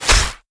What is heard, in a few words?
Spear cloth, Damage